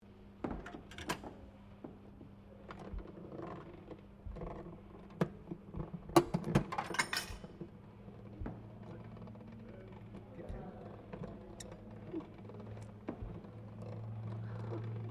Slot Machine Peep Show 1900 Operate, old coin slot machines; Casinos; Various old coin slot machines